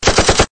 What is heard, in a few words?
rifle